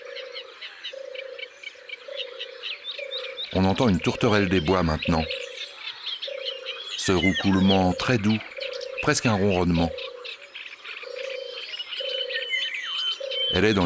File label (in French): Tourterelle des bois; abc de la nature